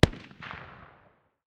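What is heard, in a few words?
light machine gun far distance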